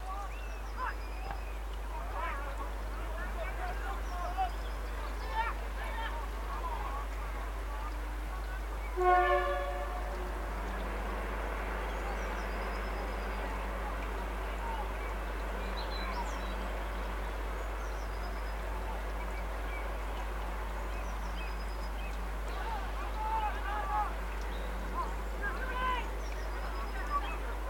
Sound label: Street Ambience with Rugby